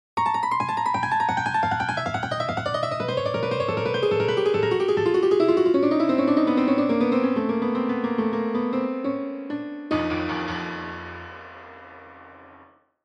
der hochdramatische Sturz

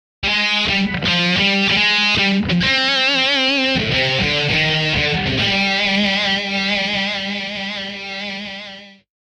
Gitarren Riff Startsound

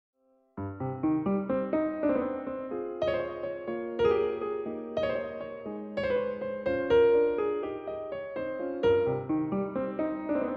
das wohlgeliftete Klavier

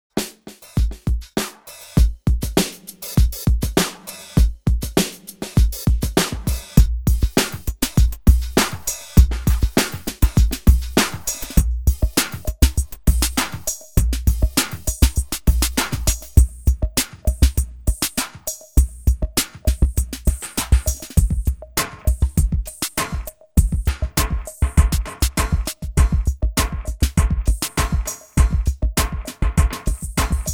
Schlagzeug Intro; percussion intro

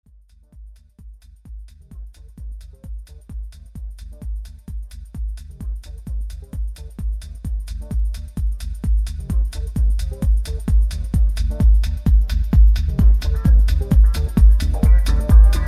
Metronom 65 Mantra

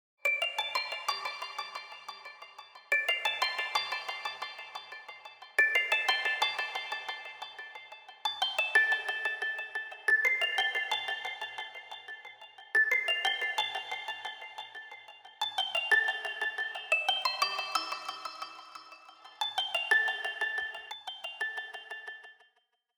leise klingelnde Tropfen Djingle

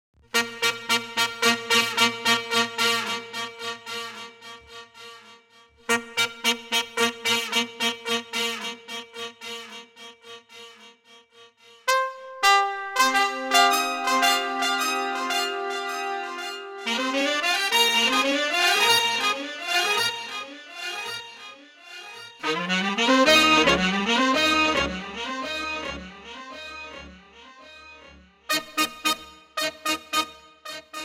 Blaeser Fanfare

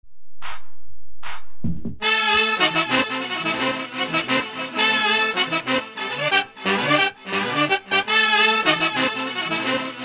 die eilige Fanfare; 18 secondes fanfare

